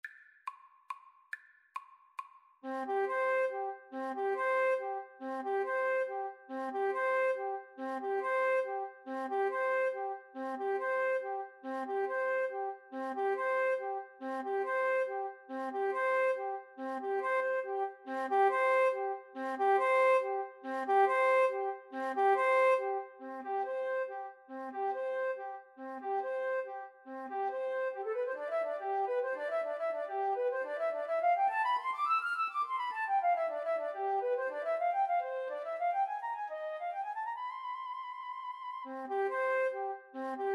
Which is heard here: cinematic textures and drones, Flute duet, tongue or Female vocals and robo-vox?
Flute duet